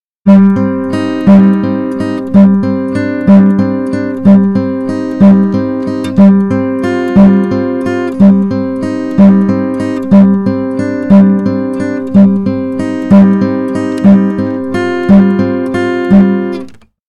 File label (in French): guitare acoustique clipping enregistrement trop fort